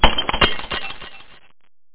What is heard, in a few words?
shatter; DRAGON20